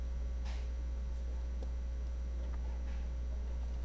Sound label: rain